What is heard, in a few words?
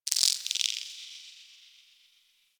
Cephalon Tech Very Small, Ambience